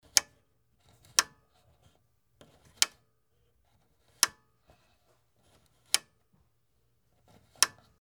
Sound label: switch